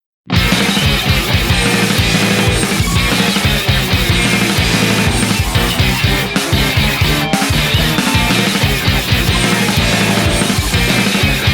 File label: instrumental